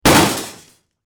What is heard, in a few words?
Car Crash; teststrecke-game